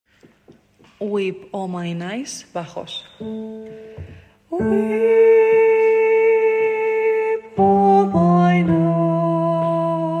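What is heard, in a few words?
weep bass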